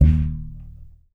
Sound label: TUB BASS D#1